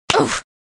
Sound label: hit death female